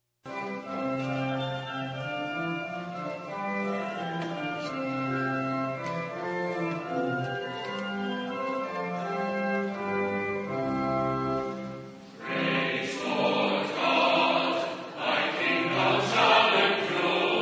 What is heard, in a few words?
Choral Response